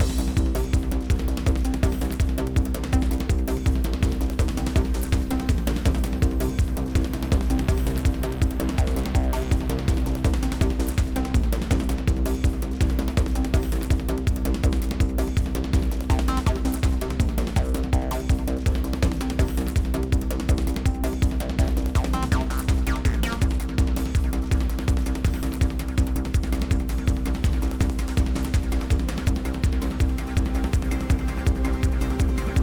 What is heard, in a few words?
Vive Chase 8CH 164BPM; Mirrors Edge Catalyst; PS4 Windows Xbox One